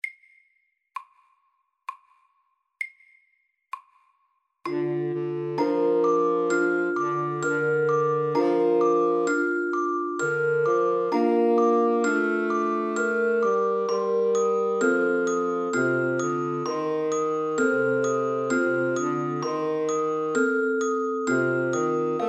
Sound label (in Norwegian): Vuggesang SAKTE